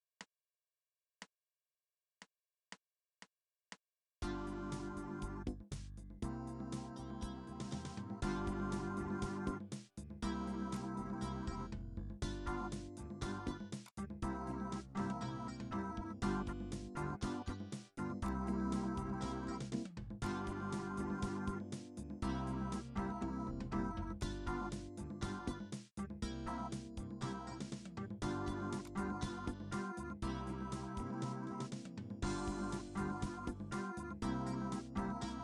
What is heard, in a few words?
G7 C7 Blues